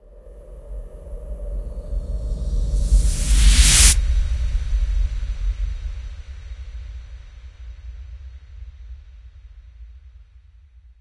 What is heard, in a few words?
Swell; friday the 13th killer puzzle